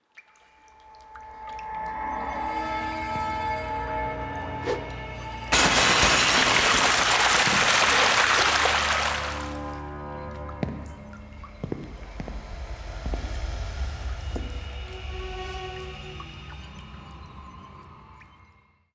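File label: flask; house of 1000 doors serpent flame; kolba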